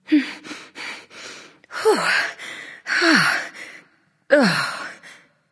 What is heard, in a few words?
breath; smite; neith